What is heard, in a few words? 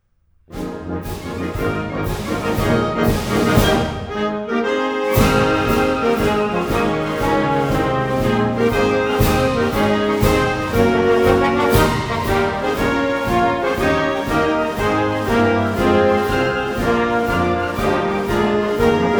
Marching Band Version; Space Force Song